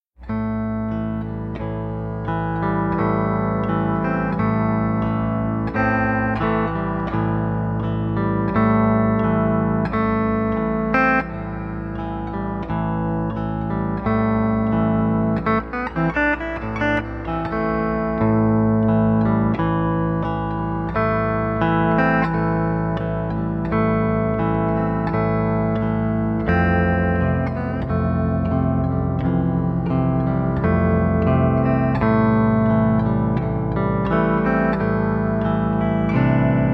Deep Forest drone, pedal steel

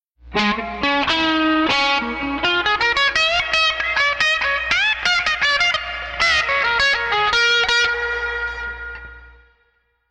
Classic Rock, pedal steel

